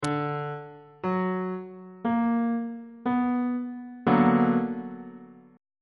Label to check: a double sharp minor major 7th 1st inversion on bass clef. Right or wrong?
Right